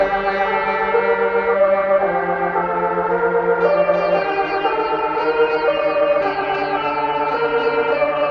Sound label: keys, Sad